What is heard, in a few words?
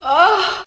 "oh" female orgasm; ooh ahh